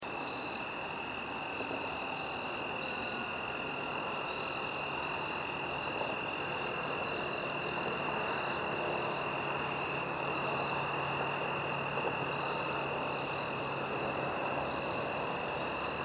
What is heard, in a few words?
greater anglewing; thomas wilson